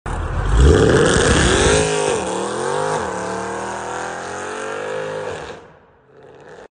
Tucson, ماشین

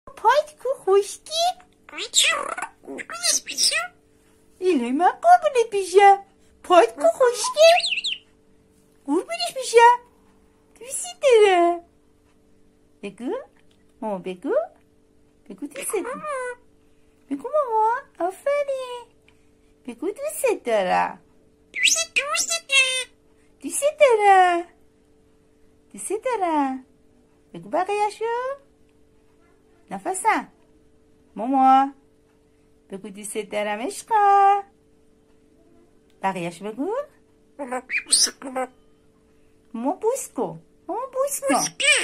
Myna, صحبت مرغ مینا

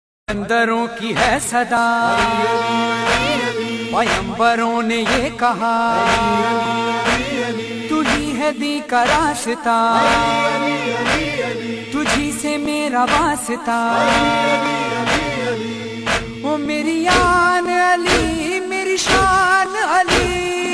نوحه

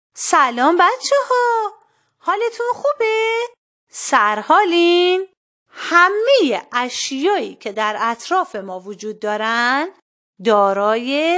شعر کودکانه

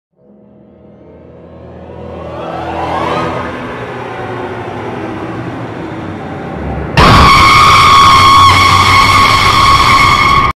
صدای ترسناک کلبه وحشت; cottage of horrors

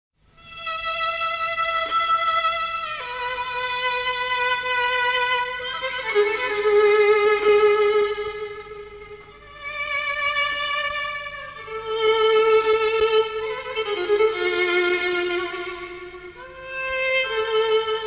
ویولن

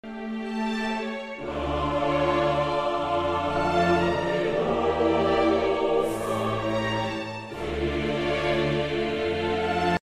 سمفونی; موتزارت; Wolfgang Amadeus Mozart

